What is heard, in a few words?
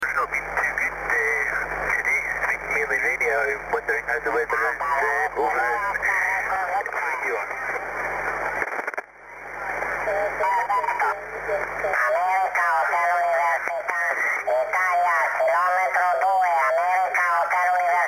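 40 metre enhanced stereo; 40mtr enhanced stereo K2; Enhanced Stereo works also for SSB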